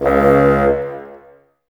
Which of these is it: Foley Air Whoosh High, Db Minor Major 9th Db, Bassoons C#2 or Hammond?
Bassoons C#2